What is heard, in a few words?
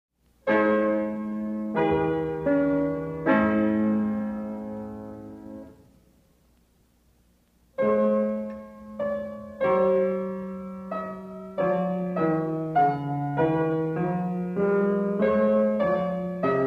Melodic